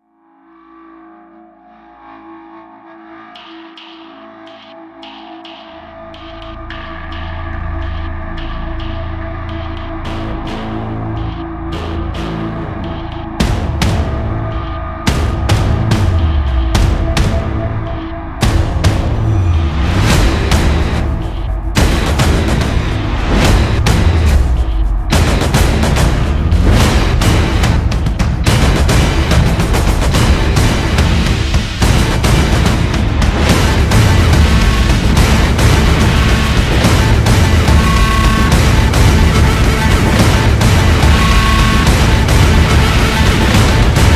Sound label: No Guitar; Ninja Tracks